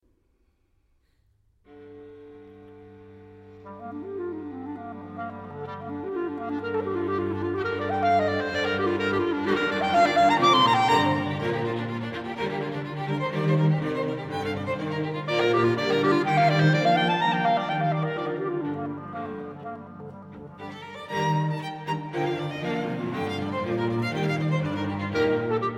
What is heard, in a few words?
Quintet